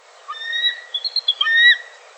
bald eagle

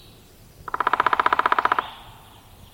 downy woodpecker drumming

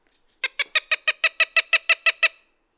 coopers hawk

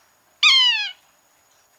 yellow bellied sapsucker

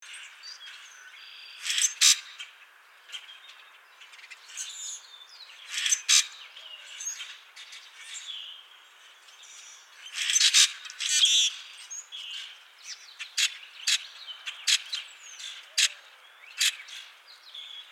common grackle

